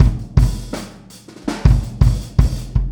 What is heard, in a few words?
Boogaloo Fill Kick, 82 BPM, Drums; Sonic Foundry (Sony Creative Software) - Drums from the Big Room The Mixes